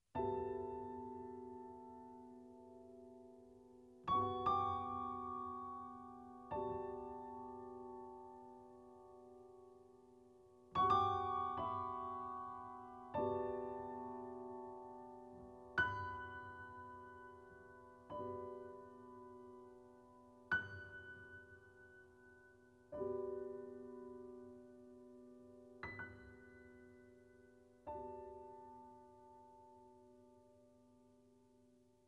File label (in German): Zwischenmusik